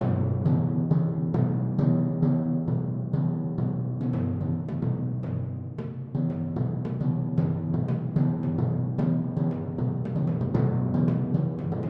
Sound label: eight pieces for 4 timpani